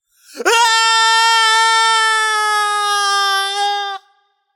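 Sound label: nonverbal fear; smite; voicepack; merlin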